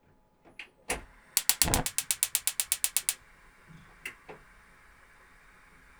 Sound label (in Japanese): ガスの音